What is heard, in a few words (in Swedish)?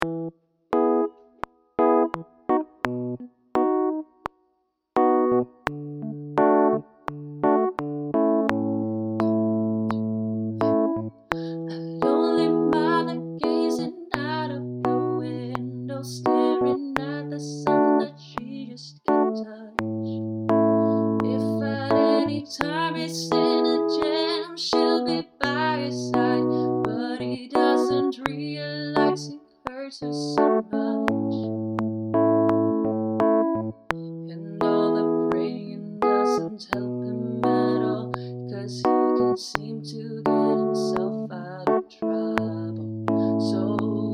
Waterfalls Melodi